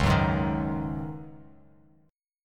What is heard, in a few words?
Db Suspended 2nd Suspended 4th Db, chord; strummed; JGuitar